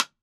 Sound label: Iron speaker; Speakers and telephones; Siemens Iron speaker